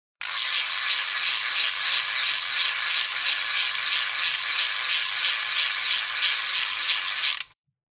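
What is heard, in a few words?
ratchet sound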